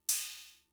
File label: Cymbal, Fricke MFB-522, Drum Machines